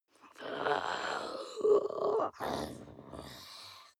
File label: witch inhale croak; kreaturen